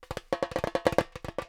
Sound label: LOOP Perc Mono